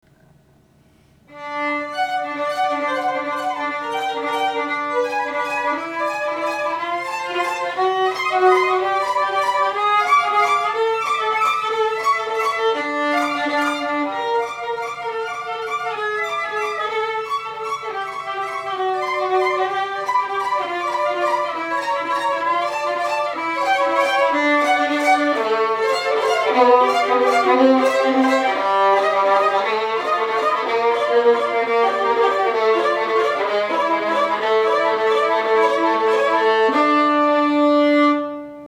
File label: violins